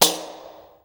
TAMBOURI.HIT; Best Service; World Colours

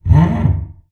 TALK BOW, BASS FINGER; Xsample VOL-5

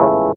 DR. LOOP; X-Static Goldmine 3; CHORDS

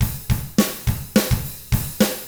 105 POP ROCK, Double Platinum Drums; ILIO

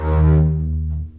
CBS D#2 MARCATO, String Collection; East West - The Ultimate String Collection

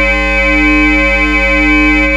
BELL C4

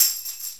Tambourine

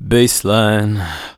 vocals sung F#; Techno Trance Essentials

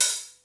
HAT, Hip Hop

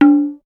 tabla + sitar, Mirage, d#1; ProSamples vol.55 - Retro Sampler

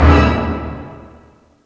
CLASSIC, CLASS.HIT; East West; Global Sounds

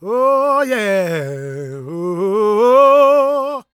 gospel male, vocal; ILIO; Vocal Planet

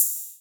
Rides, Cymbals; Vengeance - Essential Clubsounds Vol.5

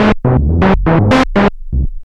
SYN FX; X-Static Goldmine 1

